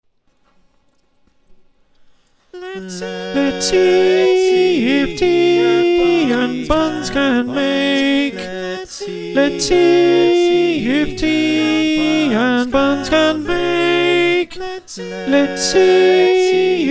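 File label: Alto, choir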